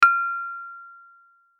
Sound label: bright ping